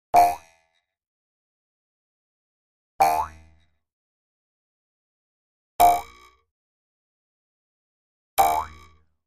Jews Harp with Twangs - 4 Effects; Boings With Wood Twangs.